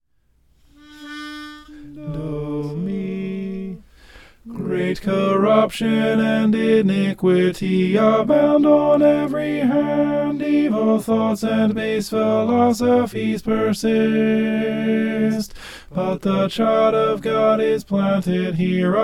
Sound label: tenor